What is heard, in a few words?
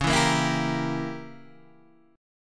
C sharp Diminished 7th, C sharp, Guitar, Open G